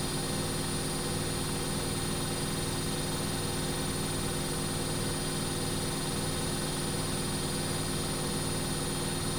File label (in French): machine a laver; Python; signal image processing